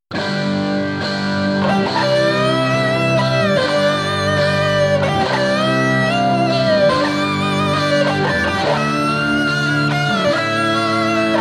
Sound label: Guitars